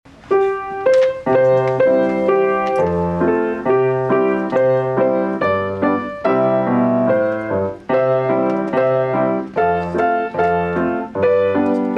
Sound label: Bingo Duet, piano